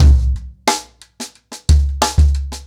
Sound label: 90BPM, ACOUSTIC DRUMS